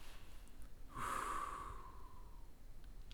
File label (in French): Soupir; Index des soupirs; Hadjithomas Joreige